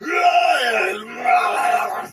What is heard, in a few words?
Death Soldier Sword Agent Male, Battle; Dialog; Barks; Melee; Sinecure